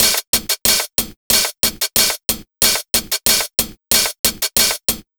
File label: DRMSND, Underground Hip Hop, BEATKIT; USB Soundscan vol.02; AKAI